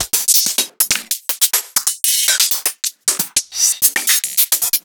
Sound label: Open Hihats